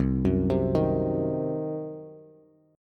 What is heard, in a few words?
C Diminished C, Bass; arpeggiated; JGuitar